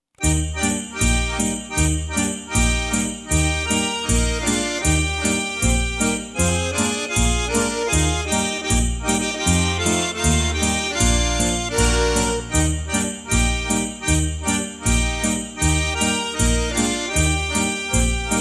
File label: jingle bells